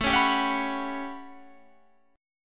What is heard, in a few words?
A 13th, Guitar Standard, chord; strummed